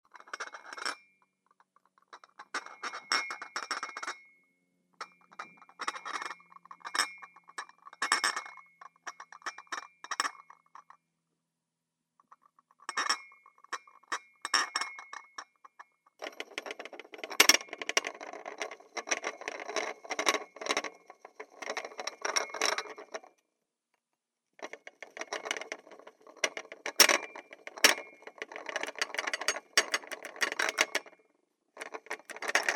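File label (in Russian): трясется посуда; Звук землетрясения в доме; Звук землетрясения в доме, когда трясется мебель, падает посуда с полок, звуки скрипа, гул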